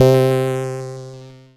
Yamaha PSS-140 Boom Piano C3

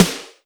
Roland R-8 Impact Snare

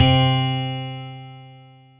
Roland JD-990 Guitar C5

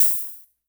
Yamaha FS1r BoomTchak Cymbal

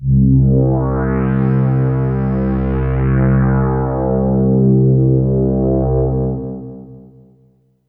Kawai K5000W Sweep 1 C2

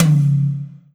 Roland SC-88 Melodic Tom C3

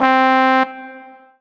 Korg TR Rack Medium Trumpet C4

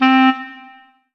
Yamaha TG500 WN Clari C4

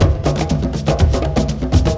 Ensoniq ZR-76 African

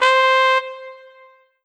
Kawai K5000W Trumpet C5

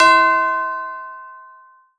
Alesis Fusion Tubular Bells C6

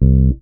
Electric Bass Low C Staccato

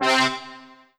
Yamaha TG500 BR Stab C3

